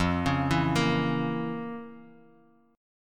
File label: F Major 7th Suspended 4th Sharp 5th, Guitar, Open D; 3,4,2,4,x,x; down; chord shape